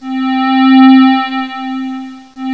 whirl; Sound Effects for Multimedia - Volume 1

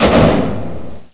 snap; jewel

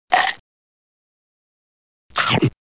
chomp; DR BRAIN; BUGS

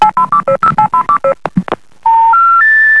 signals, telecom

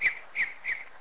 bird; Deers Revenge

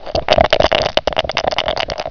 ROLL, dice; Monster Media Number 15; win game; pkdice

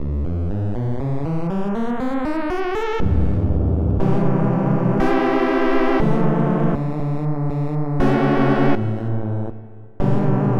Pad 3 (polysynth); FF7

